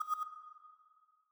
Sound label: Move F7 Mf; Mixolumia